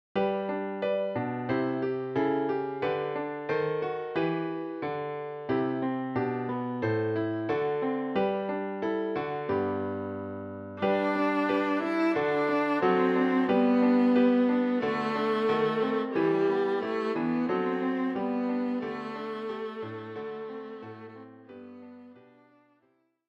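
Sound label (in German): Bratsche; Alle Jahre wieder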